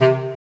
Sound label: Trumpet C3; 音MAD; 转生成为了只有乙女游戏破灭Flag的邪恶大小姐